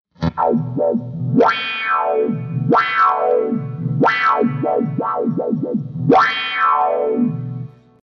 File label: Megawah